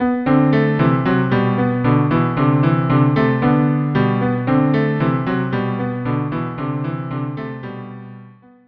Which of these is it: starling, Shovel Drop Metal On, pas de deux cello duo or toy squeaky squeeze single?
pas de deux cello duo